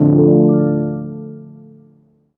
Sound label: Synth Dmin; One Shots; Instrument